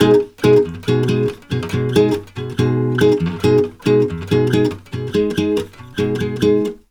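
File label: bossa B, 140 major chord; USB Soundscan; AKAI; Brazil Bossa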